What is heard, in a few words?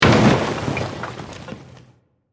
Massive Impact With A Lot Of Different Particles; Bursts; Crafted Signals